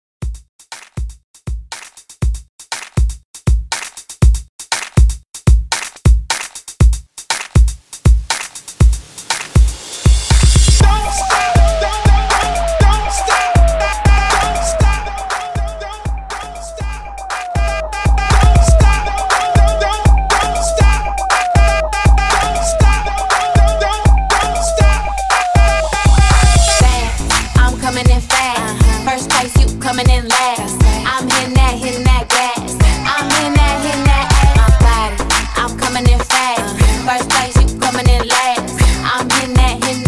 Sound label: Hype Intro Redrum 120BPM